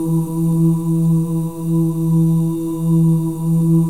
BGV OOS, M+F OOS; Spectrasonics; Symphony of Voices

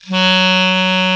KLARINET G#1

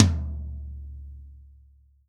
TOM 16, real drum kit; HIPHOP KIT

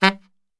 G 1 X SAX SHOT, Brass Super Section; Best Service

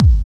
KIK, kicks; AMG; Now CD-ROM (Roland); NOW! Drums

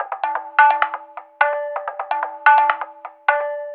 TABLAS; Zero-G; Chemical Beats

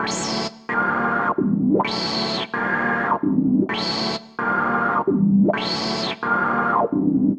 Hardcore Breakbeat, 130 Amin; Big Fish Audio - Hardcore Breakbeat Action; Hardcore Breakbeat Action CD1

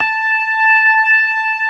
ORGAN, NO PERC; C3; Keith Emerson Signature Series

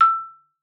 MARF E6, MARIMBA; Xsample VOL-4

